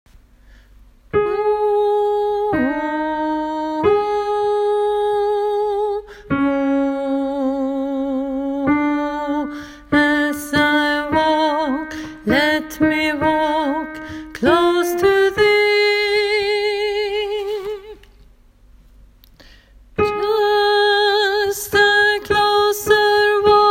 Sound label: bass; just s closer walk; åfjord